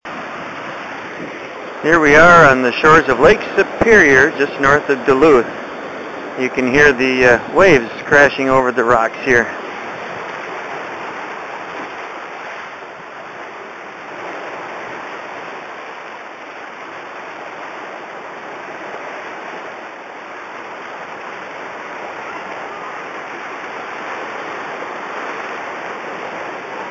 crashing waves on lake superior just north of duluth mn